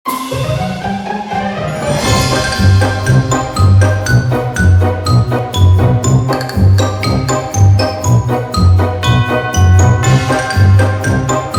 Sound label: a halloween music